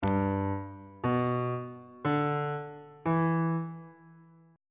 f double sharp major 6th chord intervals on bass clef